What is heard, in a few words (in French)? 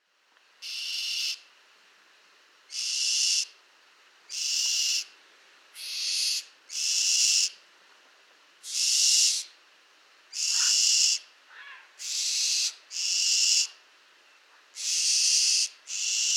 Effraie des clochers